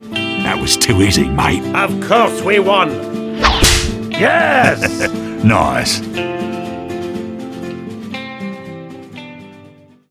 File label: win; freak fortress 2; law cowboy duo